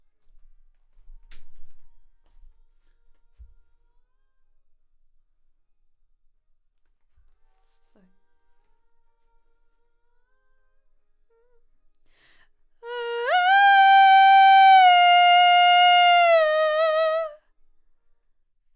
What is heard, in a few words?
Vox start new